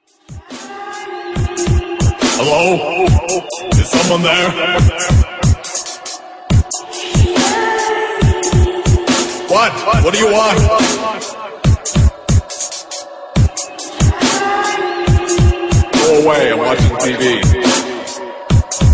dubstep remix

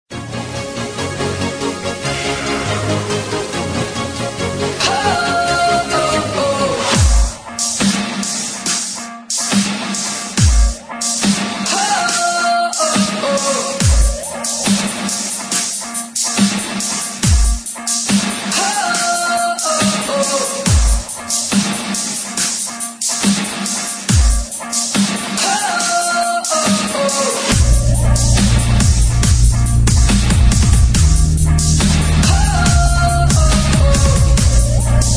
dubstep remix

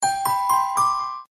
MSG Tone

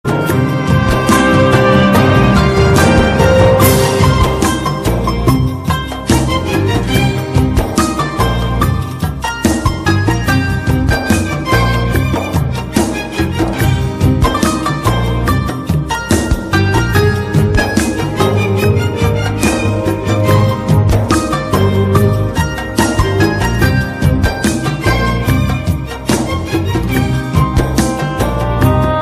Relaxing Ringtone

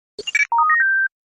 Beep; ODST; Virgil

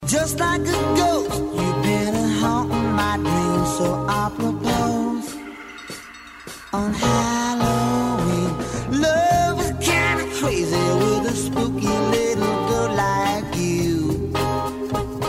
spooky halloween

